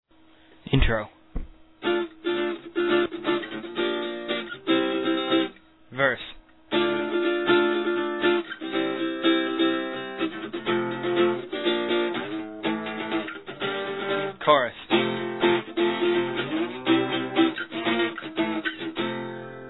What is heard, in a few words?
two step